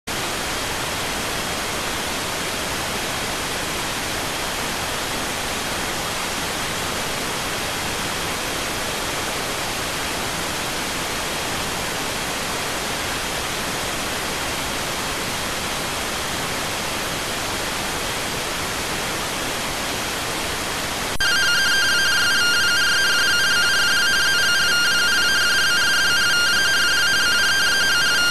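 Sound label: radioteletype receive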